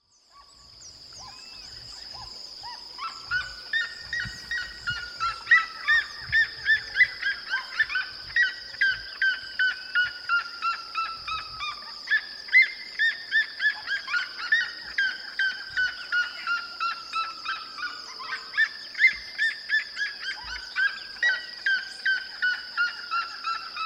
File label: Siriema Cariama cristata; Jacauna